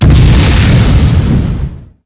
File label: Small Exp, Explosions